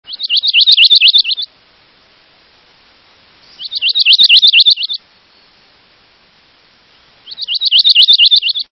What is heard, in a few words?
Bay breasted Warbler